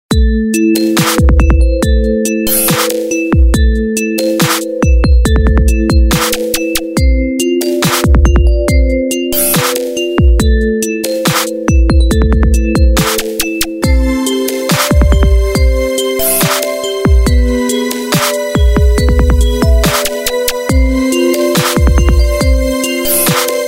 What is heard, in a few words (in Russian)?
remiks krasivyj ringon trap